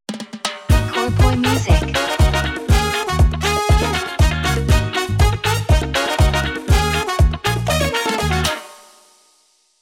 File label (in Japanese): jingle ウォーター